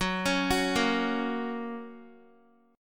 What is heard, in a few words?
F sharp Major, Guitar; x,9,11,11,10,x; arpeggiated